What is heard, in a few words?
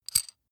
metal screw nut single